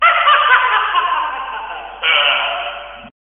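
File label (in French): pété de rire en lisant nos erreurs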